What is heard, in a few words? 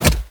Melee Impact Punch; Warframe; Purgatory Warrior